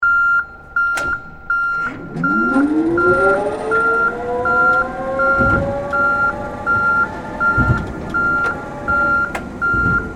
Hi Lo Operate Beeps, Industry, Hi, Lo, Operate, Safety, Beeps, On, Board, Perspective; Machinery